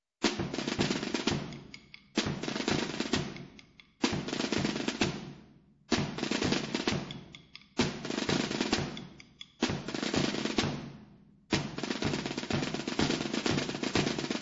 palillera, tambores; ALBALATE

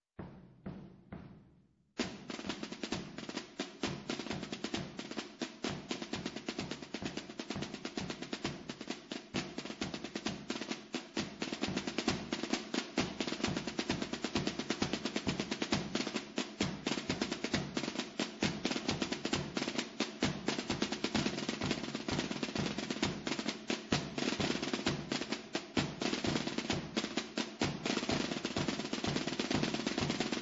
romper la hora, tambores; ALBALATE

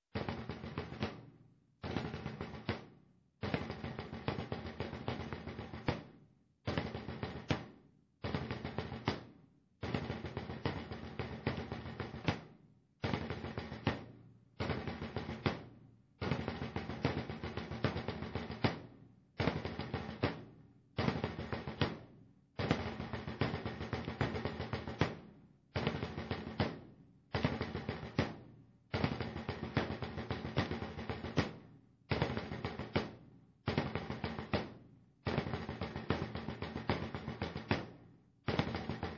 marcha, tambores; Marcha 1; SAMPER

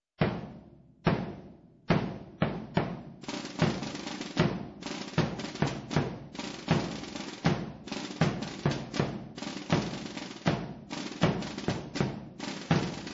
procesion, tambores; La Puebla

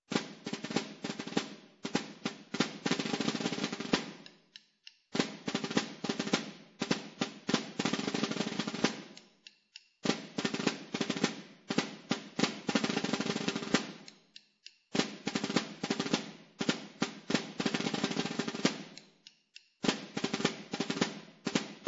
palillera, tambores; Alcañiz

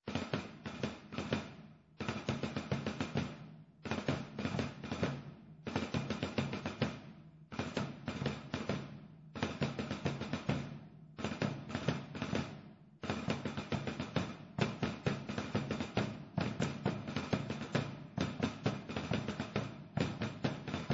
raspa, tambores; Andorra; La Raspa

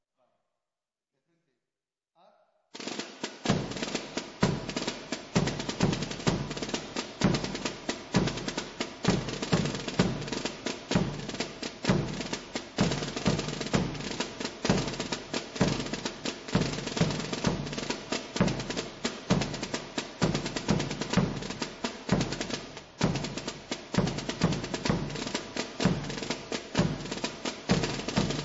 melancascau, tambores; Hijar

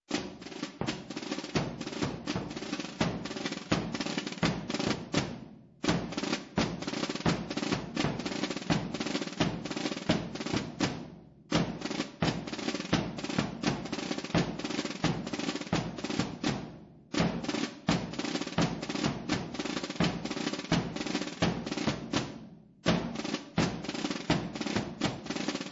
jota, tambores; La Puebla

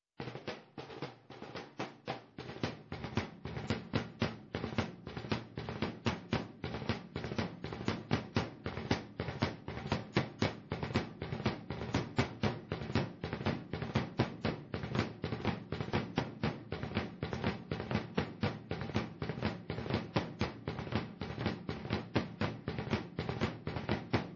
melancascau, tambores; Me l´han cascaú; SAMPER

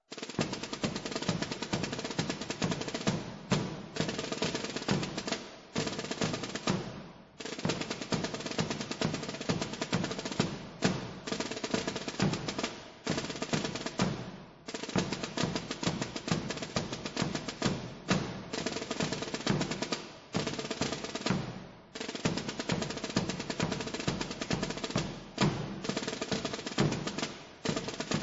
marcha, tambores; Hijar; Marcha 2

